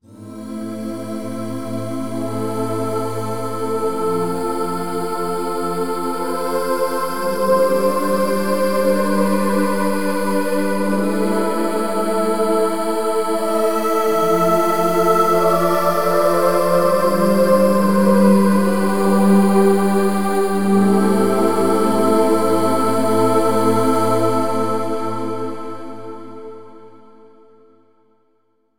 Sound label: Oouuu Porto Slur